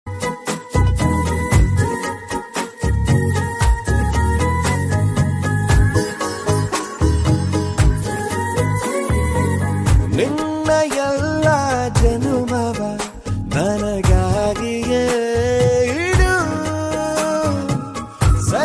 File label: Kannada Song Ringtone